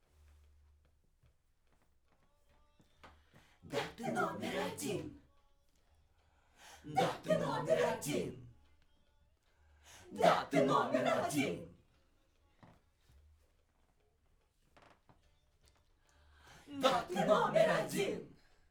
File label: Backs SCHOEPS